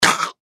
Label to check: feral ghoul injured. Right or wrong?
Right